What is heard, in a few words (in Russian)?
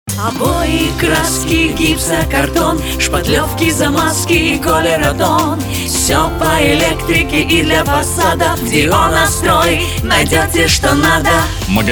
песня